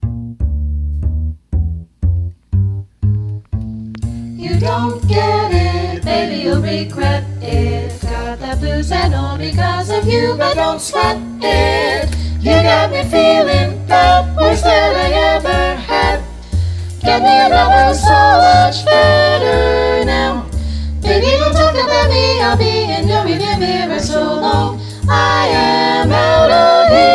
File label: Bb Vocal Jazz Etude no bass; jazz all state